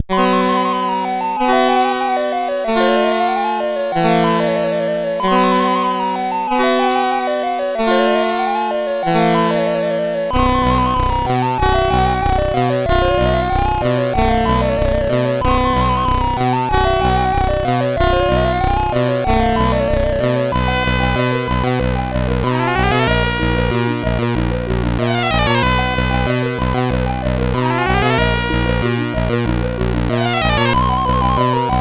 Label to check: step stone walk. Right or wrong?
Wrong